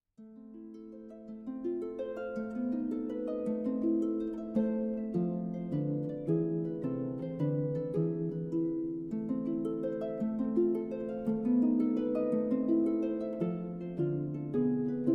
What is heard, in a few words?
Harp Solo Pour Harpe; Franck